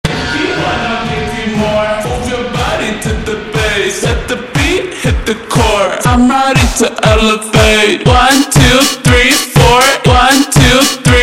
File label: ultra Slowed, funk